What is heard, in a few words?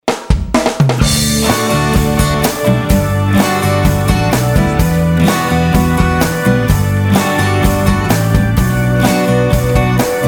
Pop Indie mix